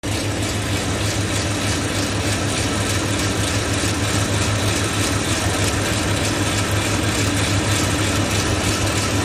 new noise sounds like its coming transfer case 4runner noise